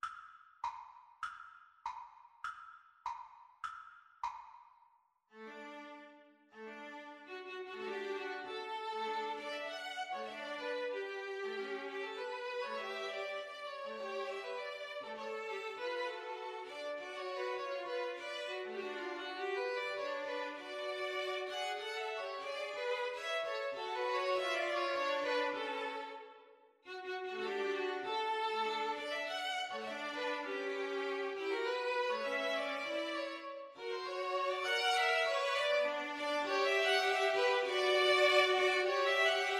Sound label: string trio; Slow Playalong